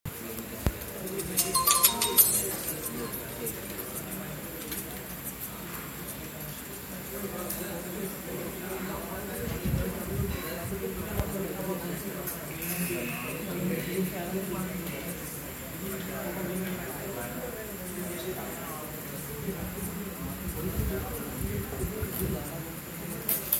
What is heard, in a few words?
karaoke recording